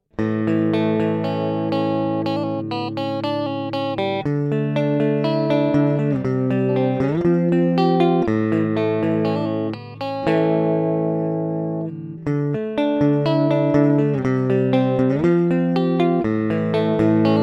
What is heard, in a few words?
Sterling clean